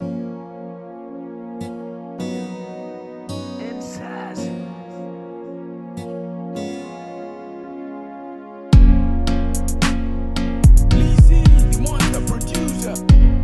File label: Freebeat Trap Hip Hop; EndSars